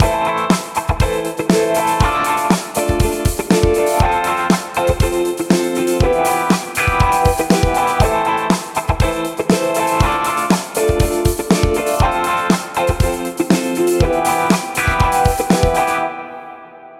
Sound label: retro